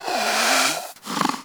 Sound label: Predator Snore